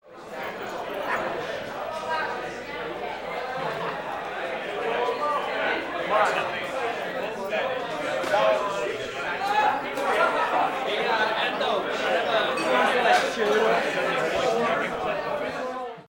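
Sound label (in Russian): бар толпа фоновый звуковой эффект; film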